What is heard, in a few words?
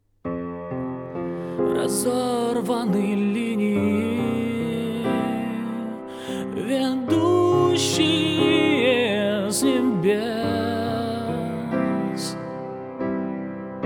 fortepiano version